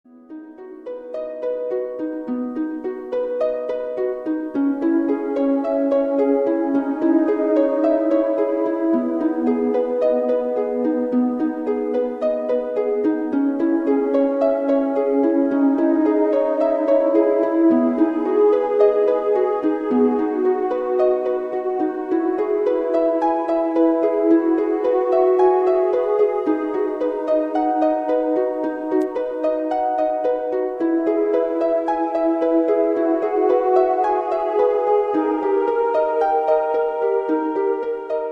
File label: lullaby